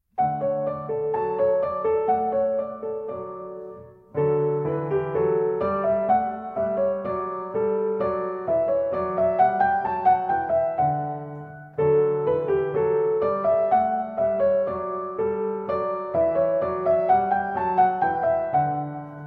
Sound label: Piano Accompaniment; 2-14 Musette; Suzuki Violin